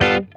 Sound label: G9 CHOP, Funk Guitar; Zero G - Funk Guitar